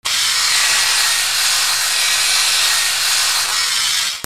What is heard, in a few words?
BATHROOM